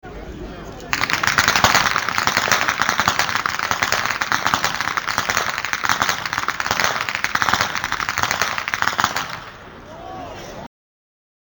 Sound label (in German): schnalzen, jugend; roth